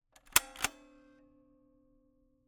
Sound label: Camera, Kodak EK2 Instant, polaroid, large, plastic, springs, snapshot; Eiravaein Works; Vaeyan IV